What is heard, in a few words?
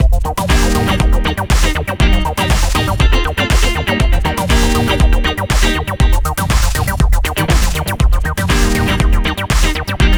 Acid Colors with drums